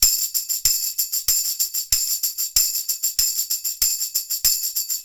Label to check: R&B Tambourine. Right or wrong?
Right